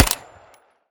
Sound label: mech, weapons uzi; Urban-Coalition; Decay; arccw ud